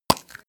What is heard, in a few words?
spray paint can open; objekte; dose